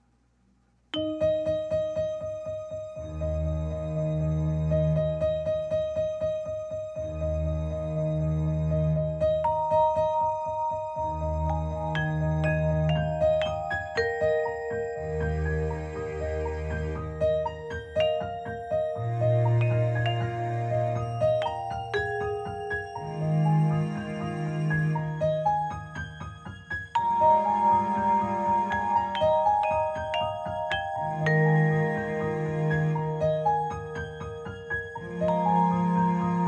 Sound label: midi vibes piano str ensemble arp free improv